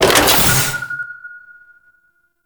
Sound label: Place Charge; Gameplay; Kahl; Warframe; Veilbreaker; Devourer Kahl